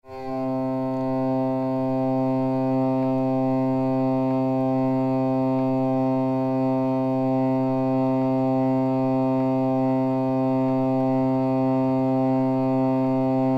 Zarrabete bordoia DO

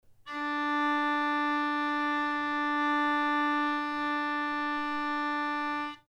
Zarrabete nota RE

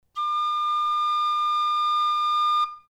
Txistu nota LA

